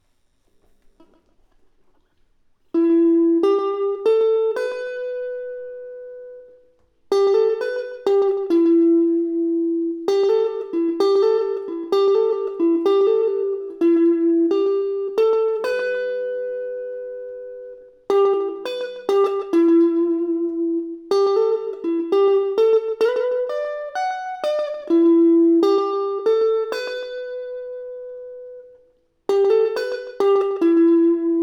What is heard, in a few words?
Elect Guitar